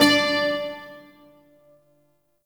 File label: Shawzin Oct Two D; Warframe; Duviri Shawzin